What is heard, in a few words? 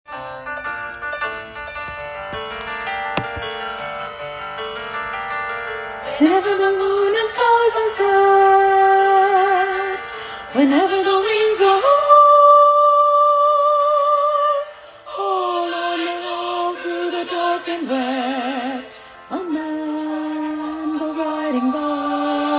alto